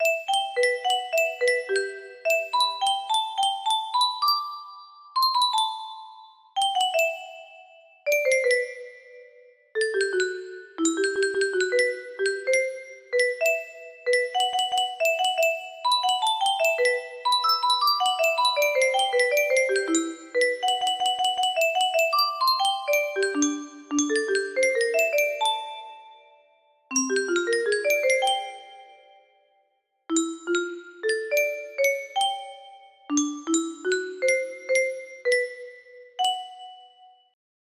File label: music box cover